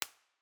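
summer site 3 1 way, impulse response, Koli national park summer; b-format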